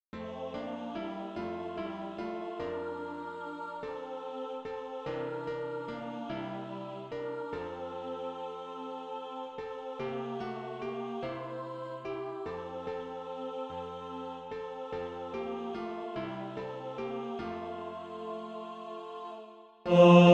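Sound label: bass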